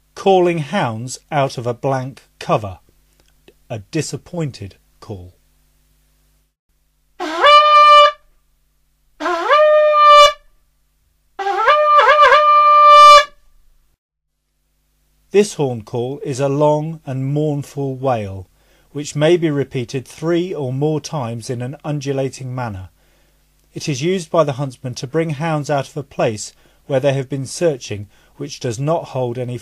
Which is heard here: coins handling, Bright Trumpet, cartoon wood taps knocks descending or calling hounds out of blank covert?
calling hounds out of blank covert